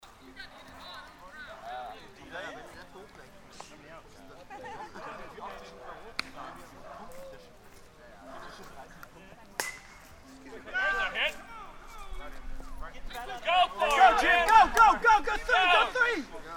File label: match atmo